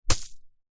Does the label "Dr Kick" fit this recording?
No